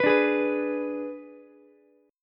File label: Ab Diminished Ab, Ukulele; Abdim strummed; JGuitar